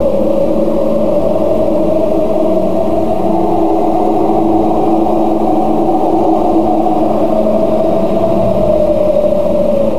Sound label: Cavern Wind; gnarled forest